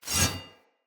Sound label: Sword Whoosh Accent; Weapons; Swords; Fenn Jotar Sword